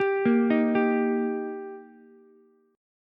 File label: Eb Major, down, Ukulele; arpeggiated